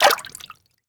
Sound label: Bird Exit Water